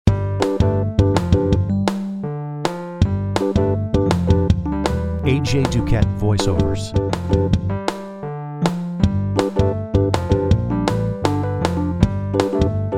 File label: Pop